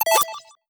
puzzle solved

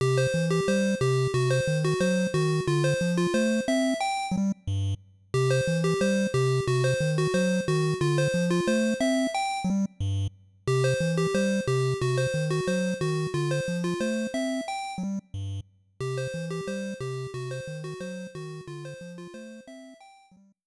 Jingle, Success; Donkey Kong Jr.

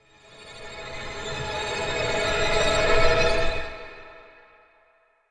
amb strings; gamerip; blackfaun

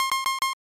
Enemy Warning; Metal Slader Glory Director's Cut; snes

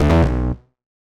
Incorrect Input; escape academy

